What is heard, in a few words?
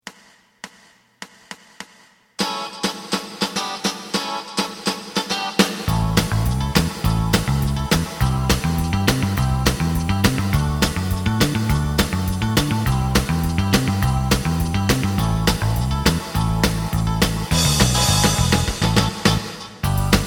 lead